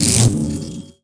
spectre attack, bugs; starship trooper; Nejlepší České Hry

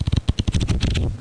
shuffle

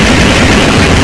rocket loop; Stealth Combat

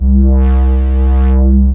station field

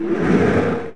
lava

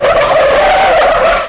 SPIN CEM

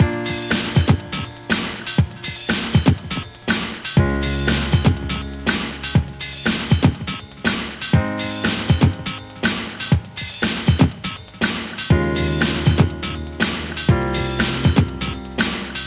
theme loop

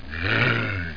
Doom 2 Explosion

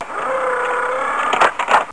gear

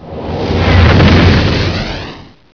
FLYBY; tv3d

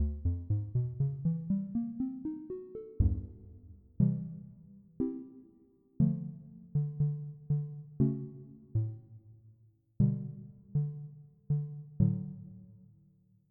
Syn Bass; Onj's Soundfont

